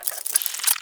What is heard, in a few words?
Tech Movement; Warframe; Corpus; Railjack